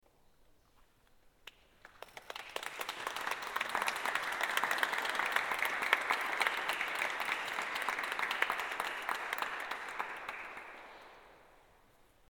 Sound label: Applause; Five Mystical Songs Paris, 28th April 2007